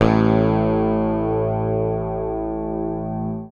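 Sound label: bass, A1, left channel; PICK; Sampleheads; Will Lee Bass Library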